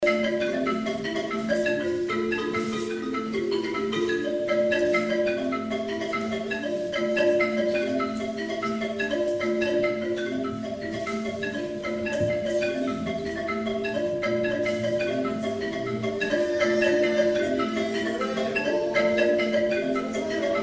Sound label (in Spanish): bambuco